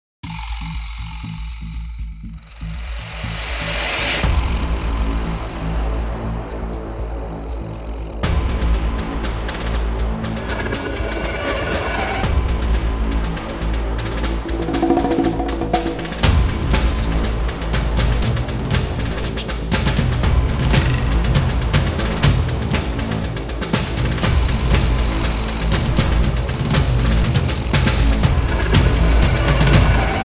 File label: FORWARD MARCH, impact percussion